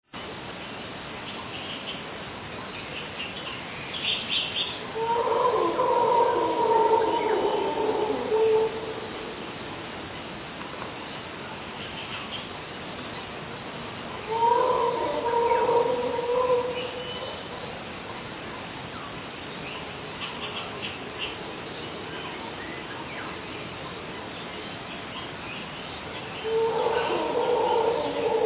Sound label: Howler Monkeys